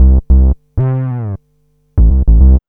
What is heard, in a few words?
B LOOP